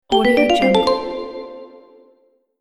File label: notification complete response